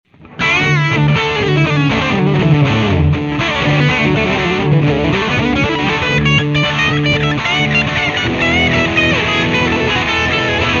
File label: MAXON DS830